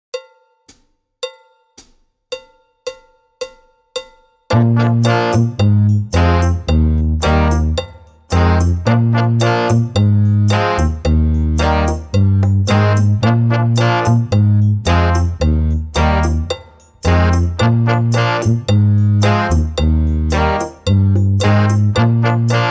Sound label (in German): ohne Drums